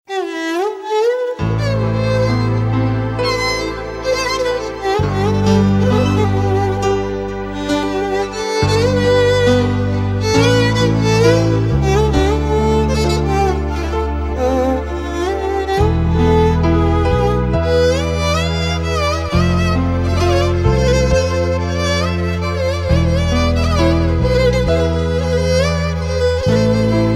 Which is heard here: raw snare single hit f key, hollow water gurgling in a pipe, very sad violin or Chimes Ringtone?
very sad violin